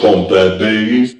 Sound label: pump that bass